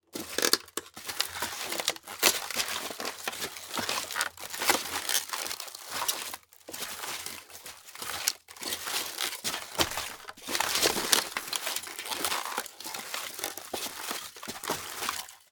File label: Footsteps Male - Boots Polystyrene